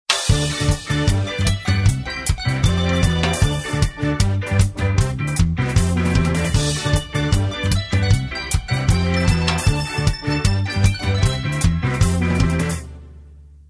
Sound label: Skatalize instrumental